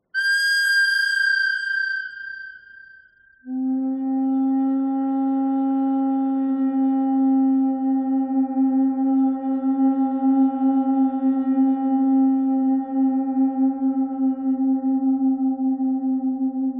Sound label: bird thread pentatonic; noreverb